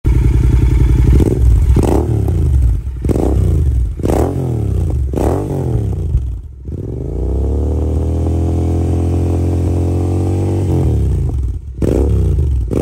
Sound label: Venom without dB, motorcycles